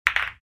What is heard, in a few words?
Pool balls hard impact